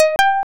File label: result success